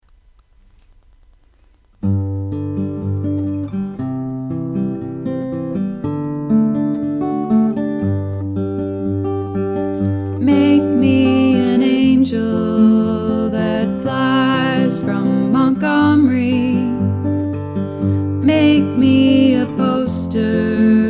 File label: chorus tenor